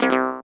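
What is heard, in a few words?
synth dance; Best Service Gigapack 1; SYN 02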